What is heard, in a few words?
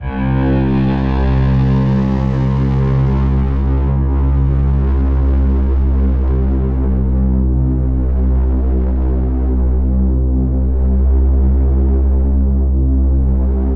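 Uneasy Bass Tone C minor 135 bpm, Atmospheric; MIDI Clips; Tonal; Ableton; Factory Packs; Mood Reel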